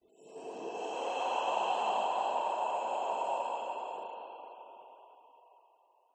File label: breath exhale low soft ascend descend slight wheeze very slight reverb; skyclad sound